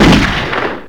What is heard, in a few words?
fire, weapons